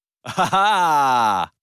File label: ostrich laugh; ha haa; family guy